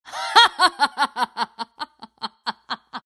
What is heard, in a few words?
lina laugh; dota